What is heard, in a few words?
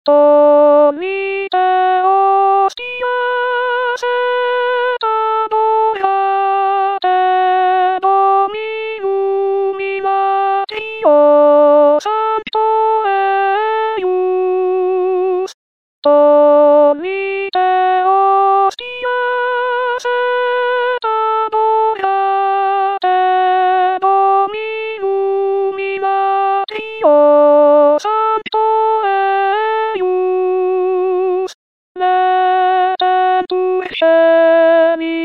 artificial alto; NOEL; tollite hostias